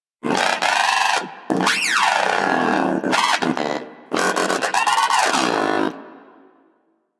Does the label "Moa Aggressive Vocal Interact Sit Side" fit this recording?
Yes